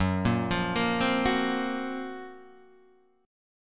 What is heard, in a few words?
F sharp Major Sharp 11th, Guitar, Standard; arpeggiated; 2,1,3,3,1,2; capo=1